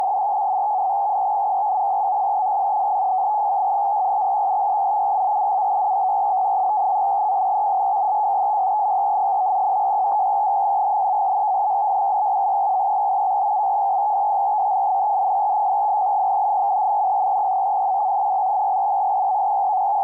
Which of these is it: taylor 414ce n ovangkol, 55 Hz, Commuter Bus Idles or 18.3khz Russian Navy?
18.3khz Russian Navy